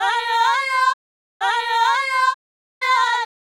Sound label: Fem Vocal 128bpm G FX, African Voices; Quazibeat; African Secret; Female FX